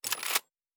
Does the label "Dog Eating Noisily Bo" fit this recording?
No